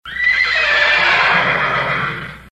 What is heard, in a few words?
horse neighing